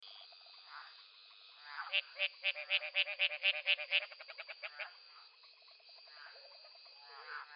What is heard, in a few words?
Smilisca baudini, hylidae